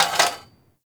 Cafe Chair Short; Warframe; Nineteen Ninety Nine

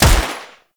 Enemy Fire 3D, Weapons; Gotva Prime

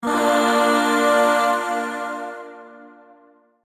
Shawzin Min Chord J; Warframe; Zariman; Void Shawzin; Chords

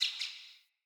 Bird Double Chirp; Paragon Bird

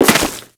Crewman Step Dirt, Footsteps; Warframe; Corpus

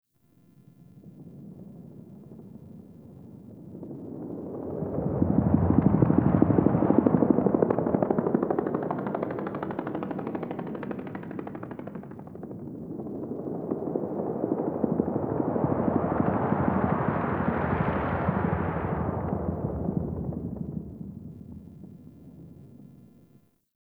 Distant Ambient Helicopter; Warframe; Ambience; Nineteen Ninety Nine

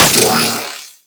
Miter Alt Shot, Weapons; Warframe; Tenno

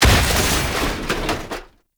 Internal Ship Damage; Railjack Hull Hit Effects Internal

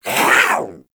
Catbrow Feral Vocal Attack Short, Enemies; Warframe

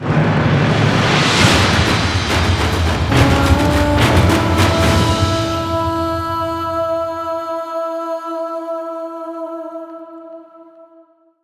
Plains Fishing Success; Eidolon

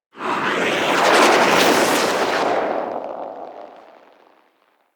Arrow Barrage Whoosh; Midrath; Warsong Prologue

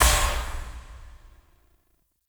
Dash End; Infested; Zealoid Prelate

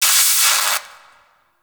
Destruction Electricity Sparks; Corpus Railjack

